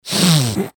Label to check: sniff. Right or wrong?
Right